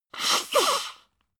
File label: nose blow; soundpool